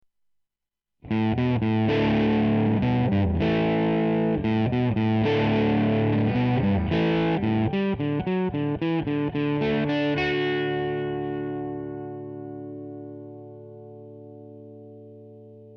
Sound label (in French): overdrive, guitare